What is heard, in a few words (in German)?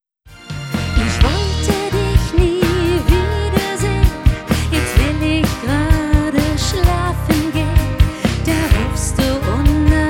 Cha cha